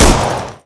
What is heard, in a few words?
smg fire